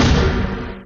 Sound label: bang; BALLY; CORVETTE; Son Corvette Original